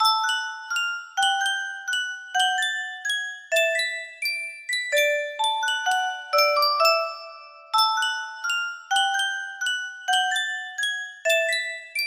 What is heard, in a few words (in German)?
Yunsheng Spieluhr, music box melody